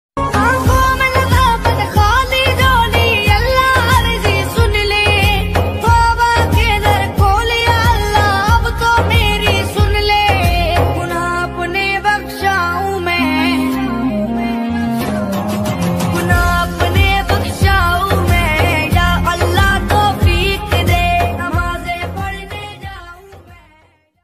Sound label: ringtone islamic ringtone naat sharif ringtone ramadan ringtones; ramzan; roza; eid mubarak